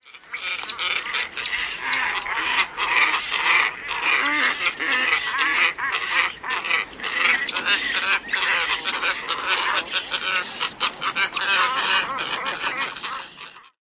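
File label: Glossy Ibis colony sounds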